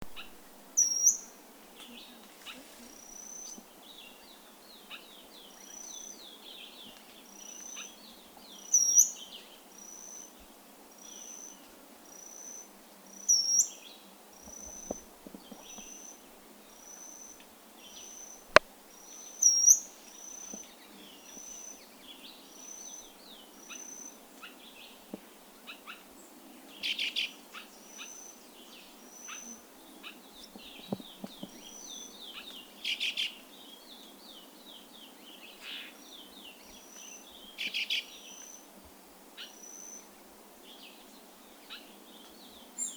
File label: Euphonia violacea